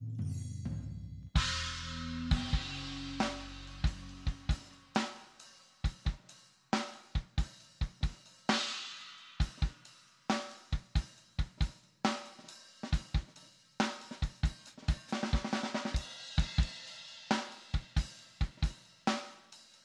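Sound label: drums without overheads compressed, real drums; snare in the overhead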